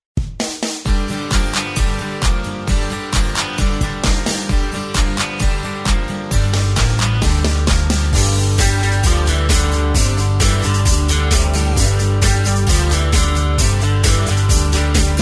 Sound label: cowboy beat; square dance music